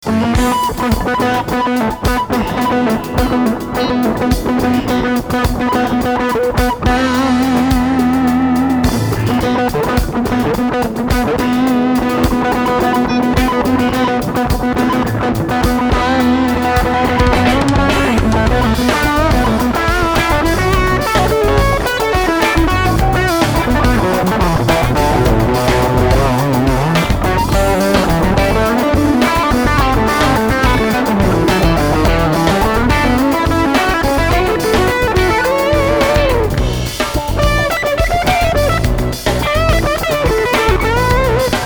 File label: Groove On